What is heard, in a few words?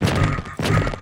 BLAZE BLOCK WAR WALK